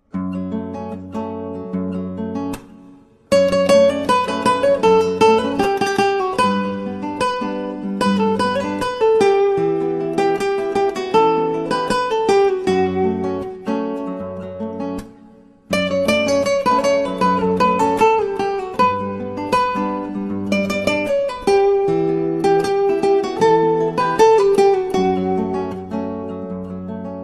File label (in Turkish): güzel bir gitar